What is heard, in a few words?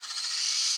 Amb Env Bird-Skreech; Sx Amb 3-Botanist